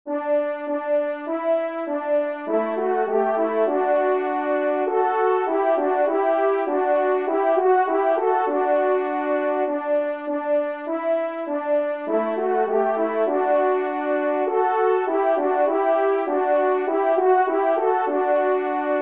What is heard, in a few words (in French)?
Cotillon Do Maj, trompe; 1e Cotillon; Corrette